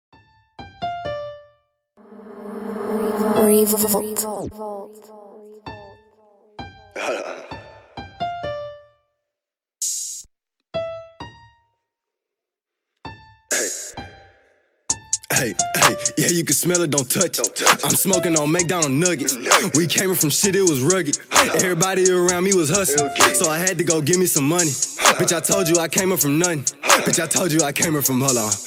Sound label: Bass Boosted